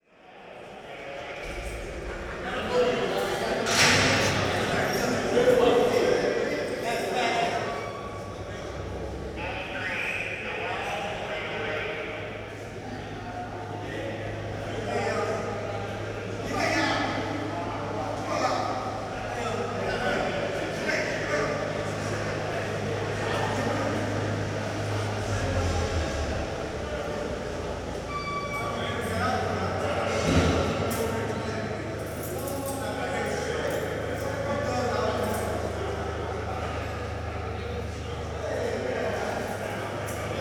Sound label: Prison Interior; Sony Pictures Sound Effects Series Volumes 1-10; Sony Creative Software